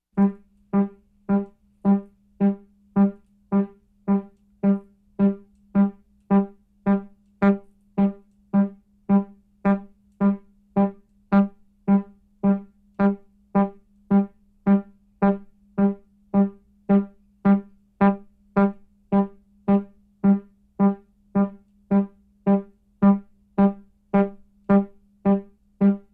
Kosmische Klaenge Pulsierneder Ton Mittlere Toenhoehe

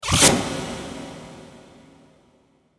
schuss verfremdet

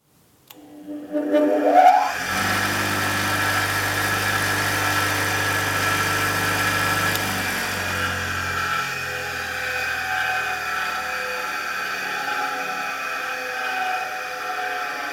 Schleifbock altes Model

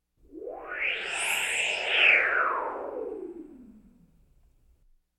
Science-Fiction Fliegender Elektronenschwarm

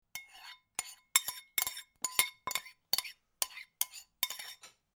schuessel auskratzen

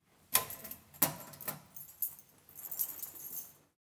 Briefkasten

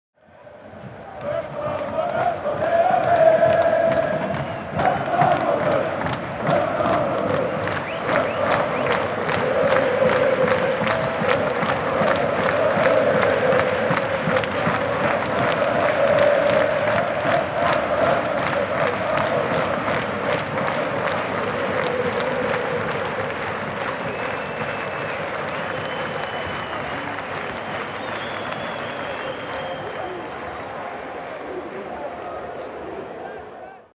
Stadion Atmosphaere

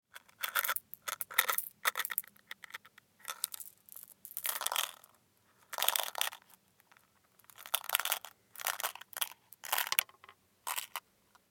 Lutschbonbon

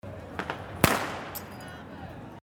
Silvester Knaller

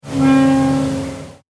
Metallquitschen

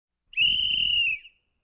Sport Schiedsrichterpfeife

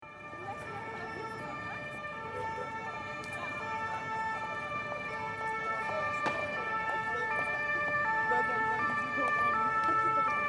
Silvester Martinshorn

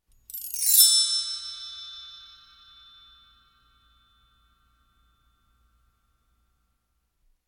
Instrumente Schellenbaum Schneller Akzent Abwaerts

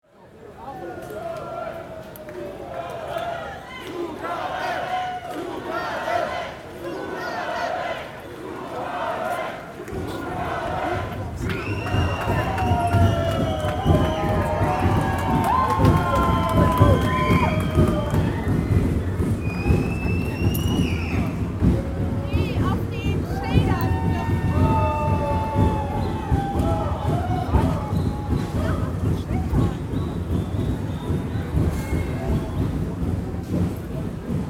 Trommeln

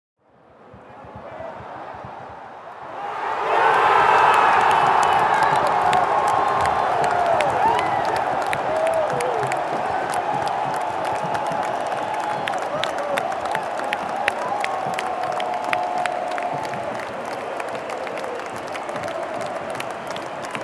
Stadion Tor

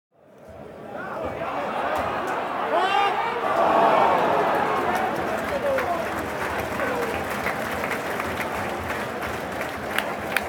Stadion Fan und klatschen

